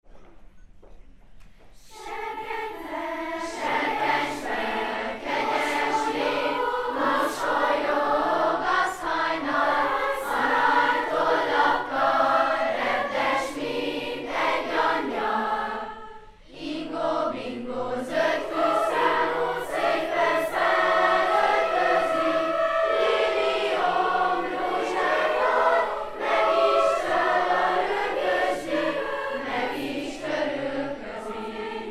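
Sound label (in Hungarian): ifjúsági iskola összevont kórusa; eneklo ifjusag 2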